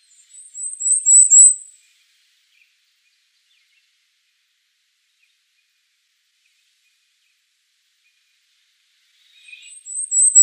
Cape May Warbler song

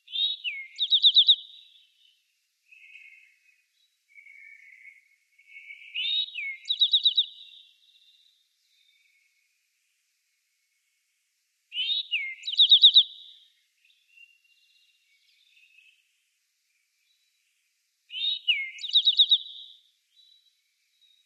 Eastern Towhee song

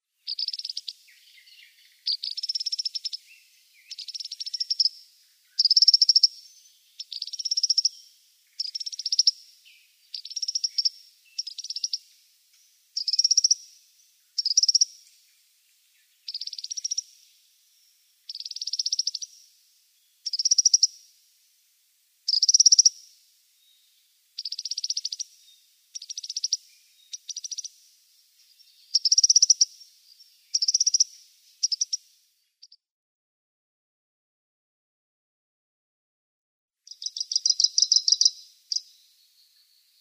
Graceful Prinia calls

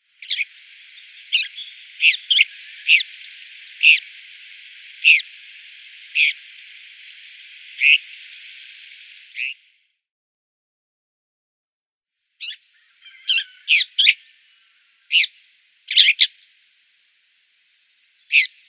Woodchat Shrike call

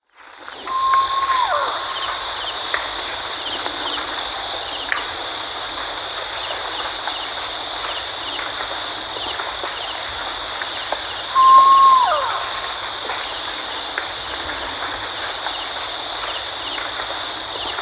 Brown Fish-Owl display

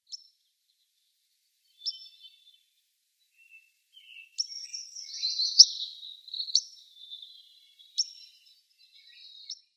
White-crowned Sparrow calls

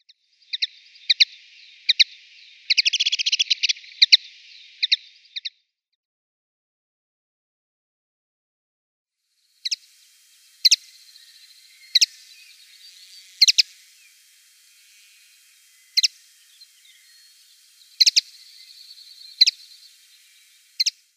Eurasian Linnet calls

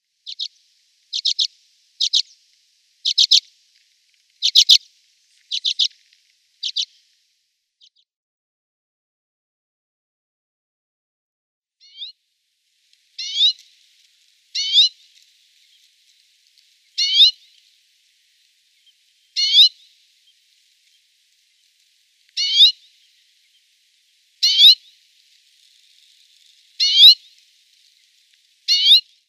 Arctic Redpoll calls

